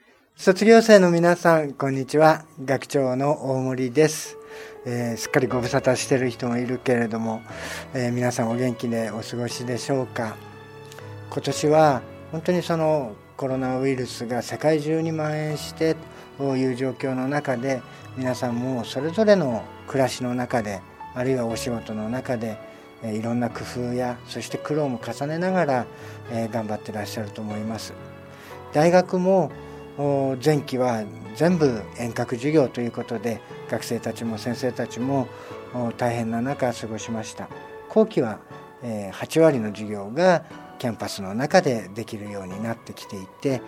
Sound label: message BGM